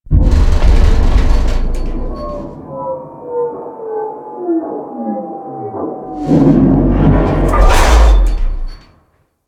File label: Ship External Machinery; Warframe; Corpus Ship Remaster